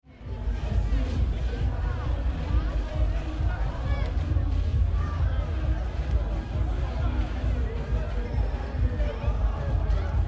Night Party Crowd, Noisy night time party city ambience; Late night festival mood in city. Thumping music from several sources; Dense; Concert Sound Effects